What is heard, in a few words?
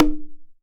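Percussion Bongo; Drums; Acoustic and Electric; Polyend; Simulacrum Sounds; 1 Shots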